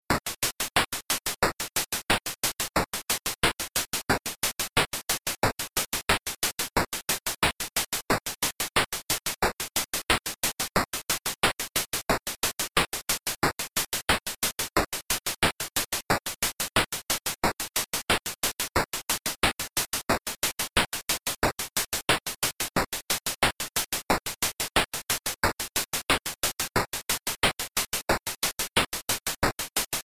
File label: only bass